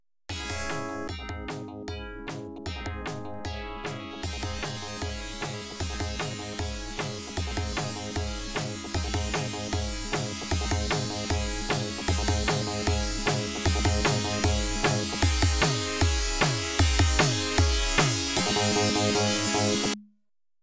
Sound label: Catwalk Timer, pinball